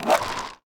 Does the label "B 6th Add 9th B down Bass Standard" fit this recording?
No